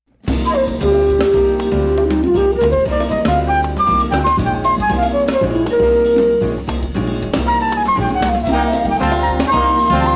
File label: Jazz Flutist